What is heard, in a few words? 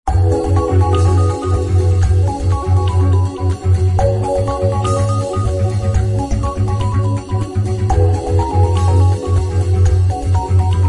background Music; word wipe